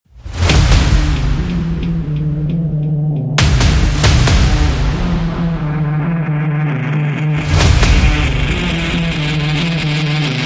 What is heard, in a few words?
Powerful; electro rock